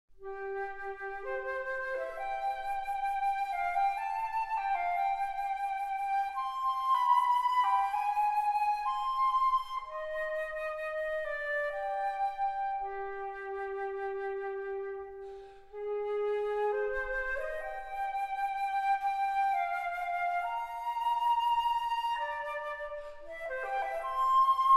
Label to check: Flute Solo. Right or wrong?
Right